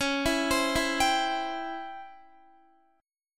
C sharp Minor Major 7th Flat 5th Guitar; C#mM7b5; arpeggiated

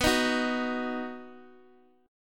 B-Major B down Guitar Open D

